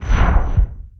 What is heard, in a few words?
menu countdown